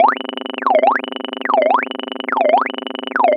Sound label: Noise, LFO & Noise